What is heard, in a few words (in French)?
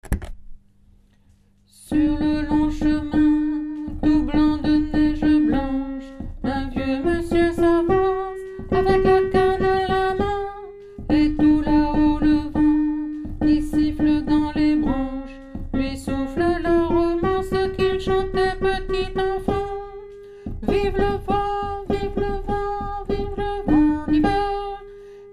alto; vive le vent